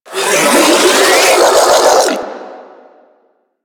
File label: Melee Mid Spin Scream